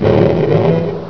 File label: roach